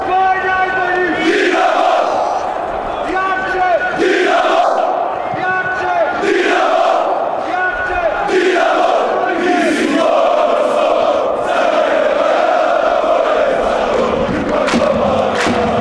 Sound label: chant, dinamo zagreb